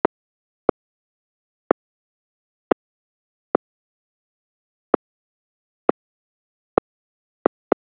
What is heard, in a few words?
HAVEQUICK Clicking